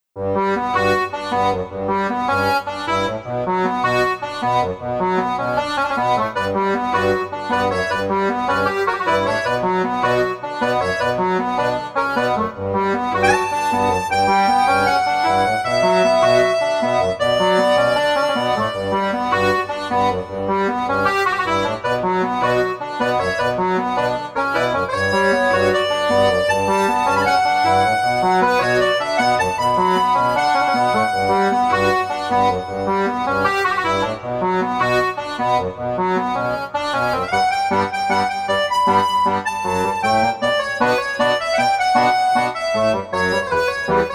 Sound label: Accordion; Sanguine Sanctum; Revita